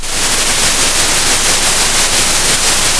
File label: pop, Sound of a thunderstorm